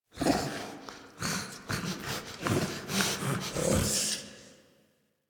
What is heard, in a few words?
Idle Snivel; Tagfer